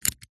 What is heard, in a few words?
household padlock combination dial turn; zapsplat